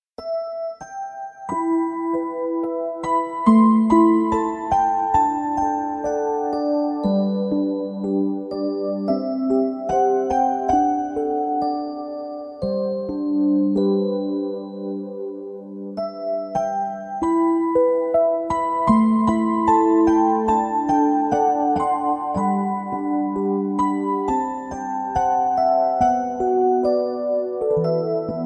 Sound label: Romance Melody